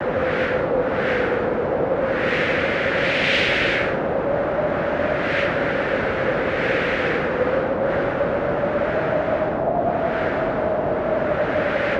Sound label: Wind Howling; nvidia gameworks; waveworks